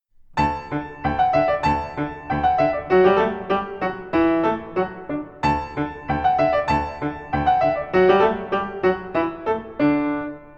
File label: musette